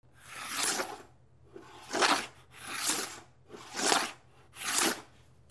FRICTION

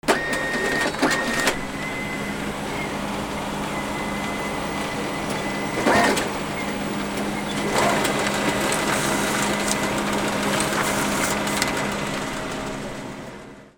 OFFICE

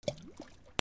WATER

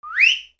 WHISTLES

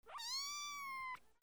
ANIMALS

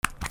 BATHROOM

